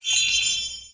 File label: skill sea shooting; agar agar; cookie run